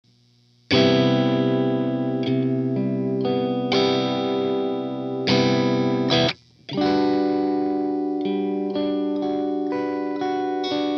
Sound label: pattern amp modern